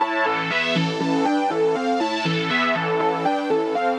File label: Progressive Synth Line